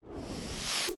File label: special fall; battle